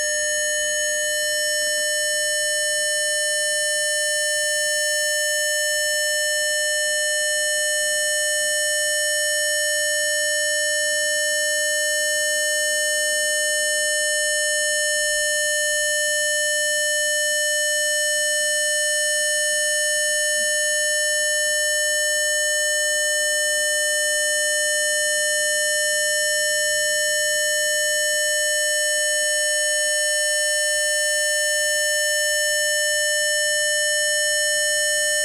Speaking thinking modulation

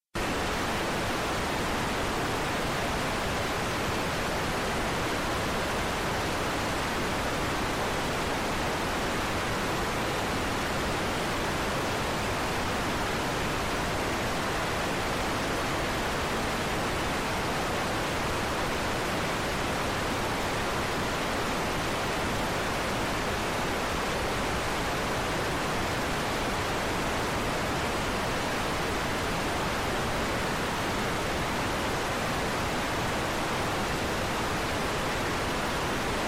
recording radio station

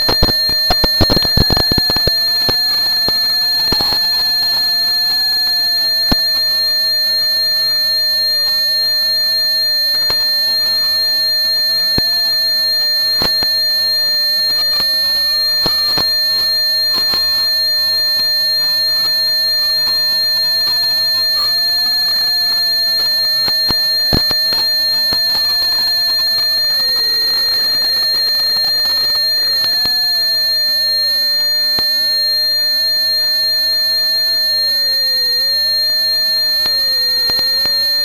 directional speaker with ultrasound mic recording

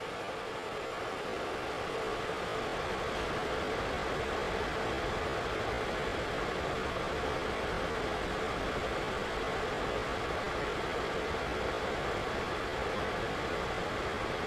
cooler fans with mist not amplified

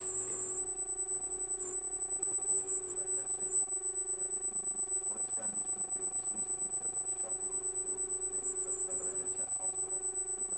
modulating tone to tv; modulating tone to TV sky news

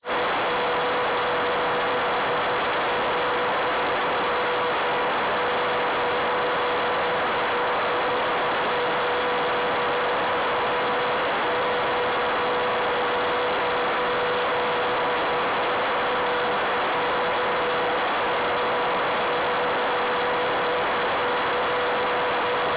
harmonics conversation

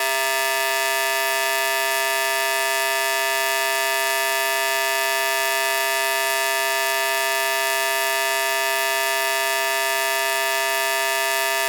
recording pitch change speak thinking

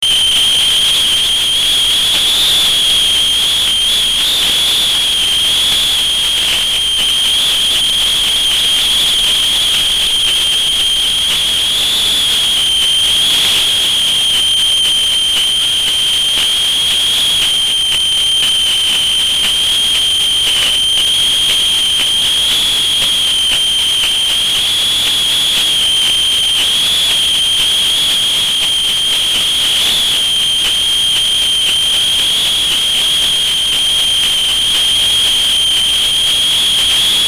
ultrasound locating tone in air; ultrasound Michael into TV tone in air

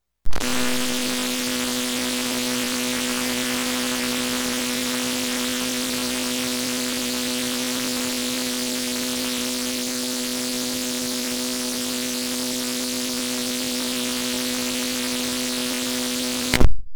ultrasound jack and jill went up the hill

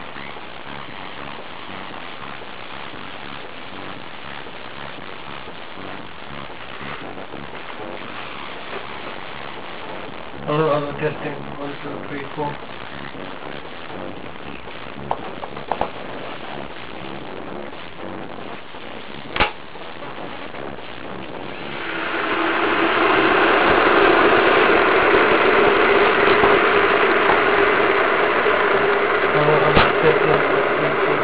song and talking over kettle boiling

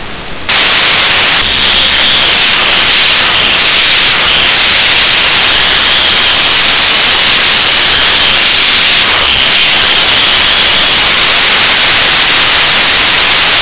SDR with PMR transmit; audacity

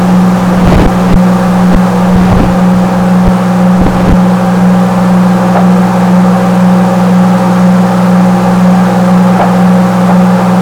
isochronic tone brain entrainment

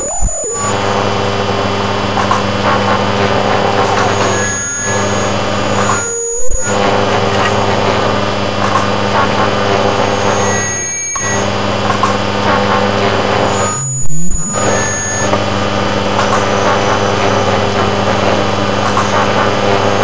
radio transmitter subliminal recorded

